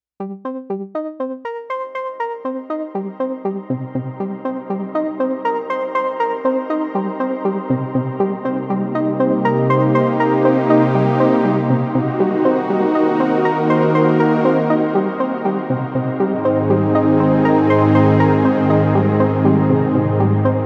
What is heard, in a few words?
Synth Cathedra